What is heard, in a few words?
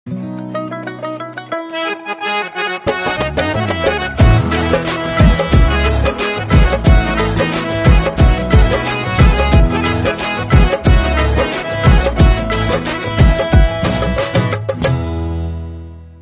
Instrumental